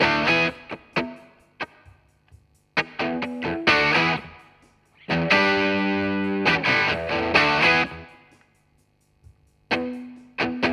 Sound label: Demon Tubescreamer